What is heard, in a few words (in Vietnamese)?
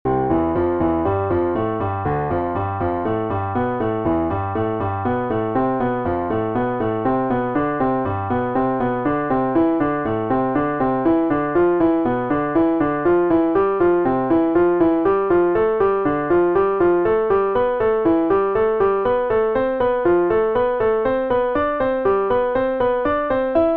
bai tap luyen ngon piano